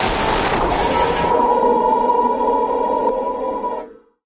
Data Destroyed Text, stinger

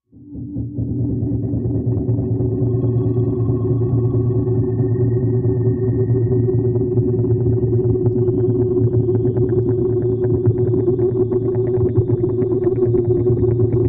Submersible Doubt Mac, sci-fi ambience

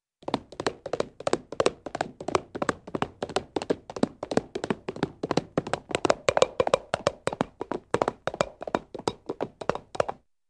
Funny Horse Hooves

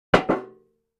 Aluminum Pan Buckle; barrels buckets & cans

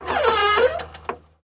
Oldsmobile Isolated, trunks & hoods

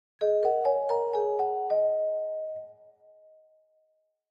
Clavichord Chinese

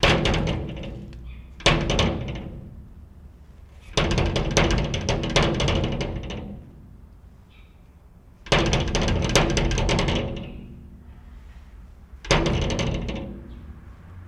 Jail Door Rattle; jail & prison doors

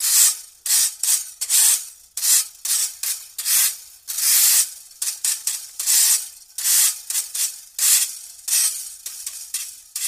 Rake: Cement

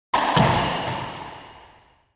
Racquetball Ball, racquetball

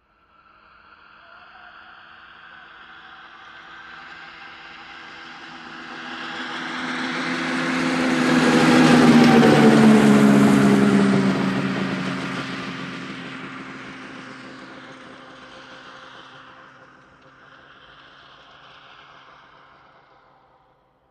Snowmobile; 550cc Engine, By Medium Speed.

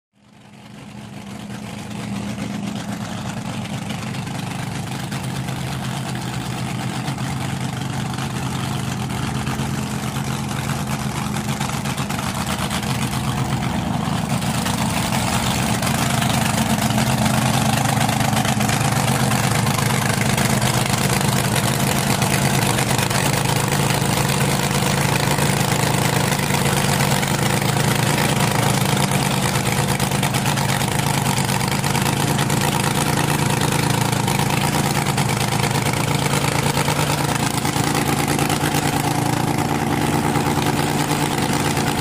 Drag Boat Idle revs

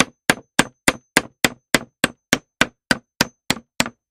Hammer Nail Wood; hammers

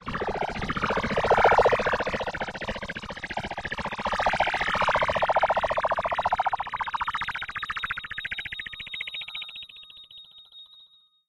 Multiple ray gun blasts. Zap, Ray Gun

